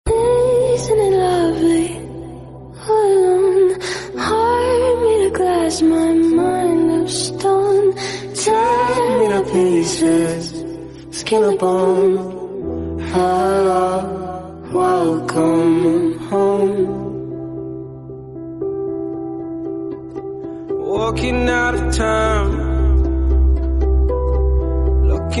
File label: english song tone ringtone; english ringtones